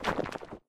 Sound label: Space Rock Crack; Doodle Jump 2